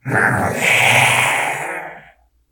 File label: pain, voice; garrysmod